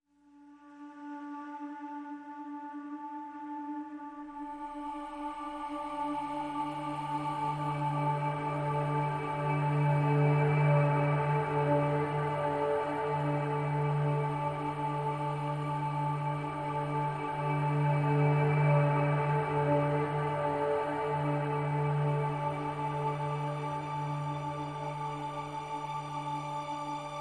Pads and Pulses Only

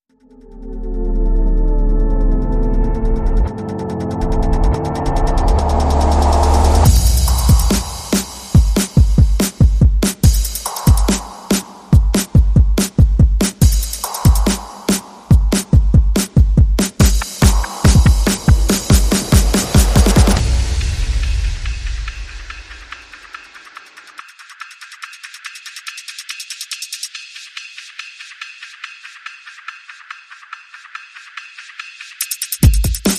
Perc and FX Only

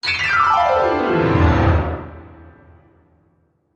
Piano Gestures

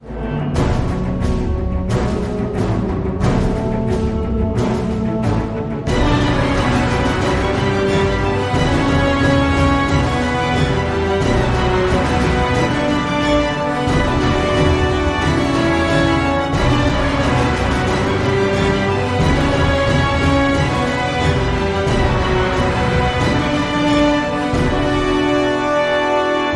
No Choir

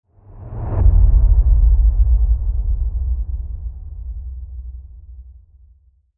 Low Boom; Mountain Breeze

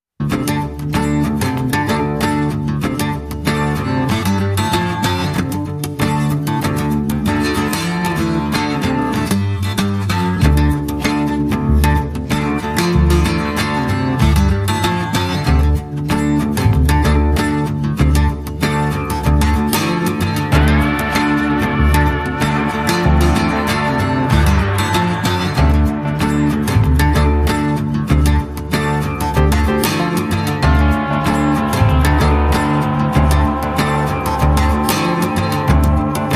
No Perc

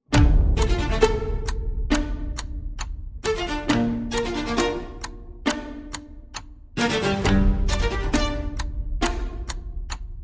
Strings Percussion and Effects

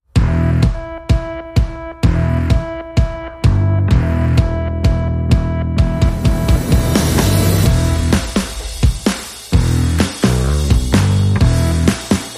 No Guitar

